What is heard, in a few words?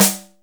Snare, Akai MPC500